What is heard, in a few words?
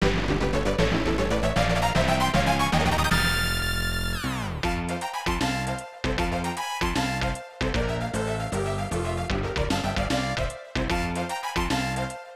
Multi-Player Victory